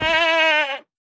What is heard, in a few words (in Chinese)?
山羊死亡声